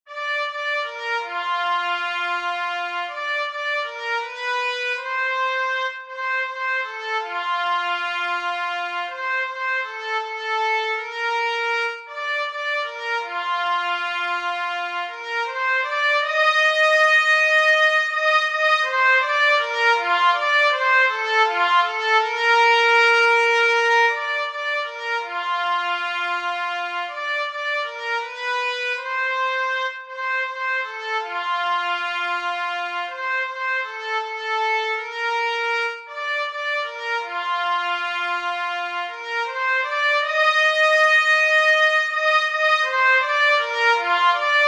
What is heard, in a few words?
Trumpet Tune, Trumpet in Bb